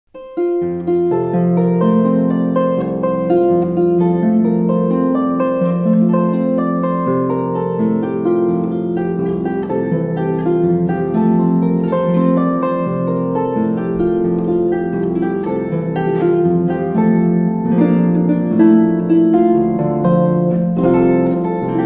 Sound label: good christian men rejoice, christmas carols on the harp